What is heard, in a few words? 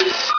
beep